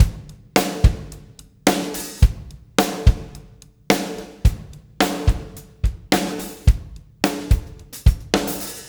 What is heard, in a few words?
PULL, Backbeat, PULL UP; Spectrasonics